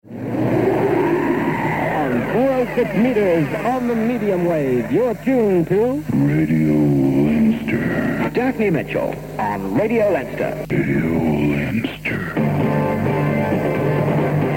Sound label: Radio jingles; Radio Leinster